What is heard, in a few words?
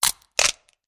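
sounds of a snapped neck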